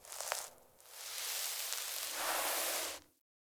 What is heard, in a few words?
Puppy Foley Cub Idle Variant; Warframe; Kubrow